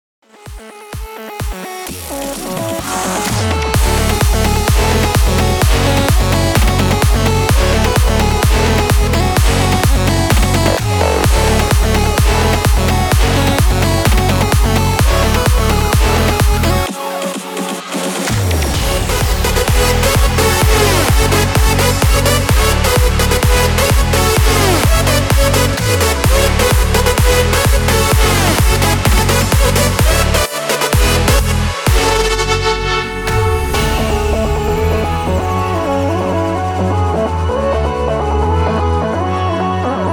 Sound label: 128 BPM